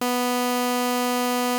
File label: Prophet 5 B4, Synthesizer; Hollywood Edge - Giorgio Moroder Rare Synthesizer Collection